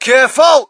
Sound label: crowd, shout; Mike Tyson Heavyweight Boxing